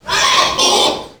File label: pig chase; A Machine for Pigs